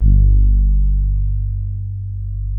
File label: soft brass, poly soft G1; Music Store - Claudius Diemeter Schwerlos